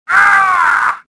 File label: death, worker; worker3